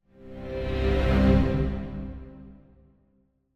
Symphonic Hit Bass Strings Calls - Increasing Chord; Hollywood Edge; sound designer's toolkit v2